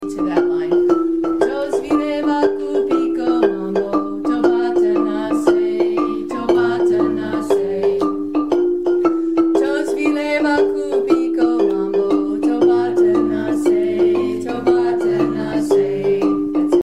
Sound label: harmony line, marimba; kare mugomba